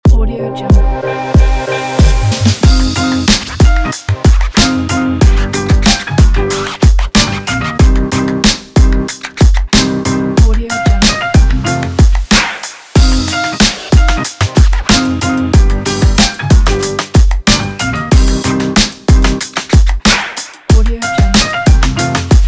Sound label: hiphop vlog